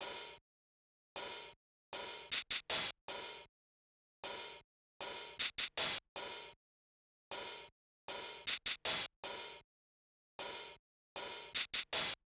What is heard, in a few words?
Hip Hop Hats + Shaker, Drums; 078; Pro Tools Essential Loops; Digidesign